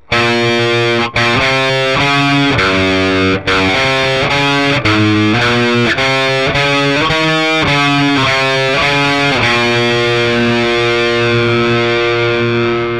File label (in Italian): distorta pezzo